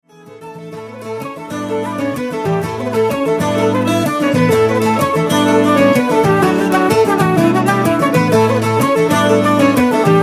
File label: Irish Band